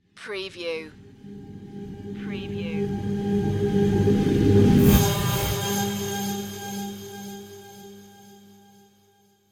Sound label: bonus item shimmer; Game Sounds; the sound pack tree